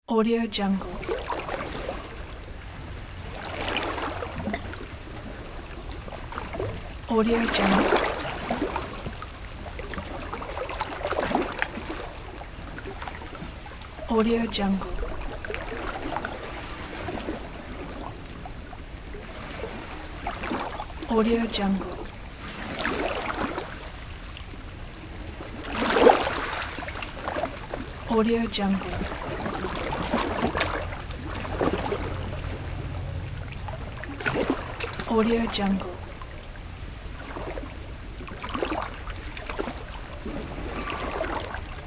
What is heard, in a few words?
tranquil sea waves